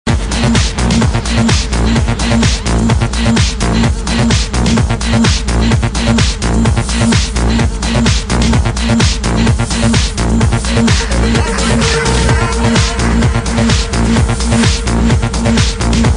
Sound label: drum idea